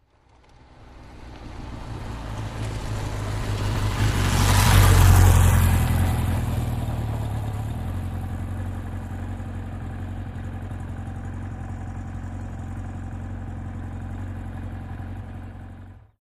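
Motorcycle, Kawasaki 750, By Slow, Stop Medium Close Up, Idle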